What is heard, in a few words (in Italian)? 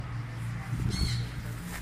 pappagallo mascherina nera